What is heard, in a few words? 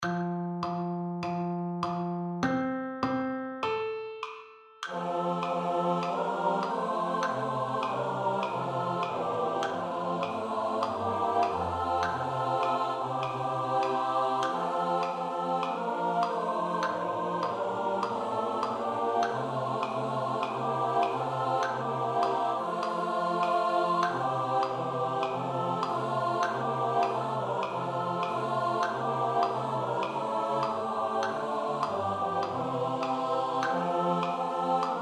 SATB STUDY